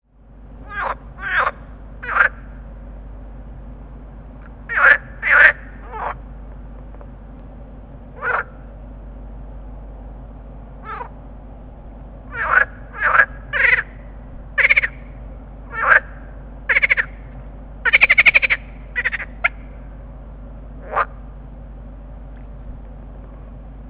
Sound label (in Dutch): groene kikker roepkoor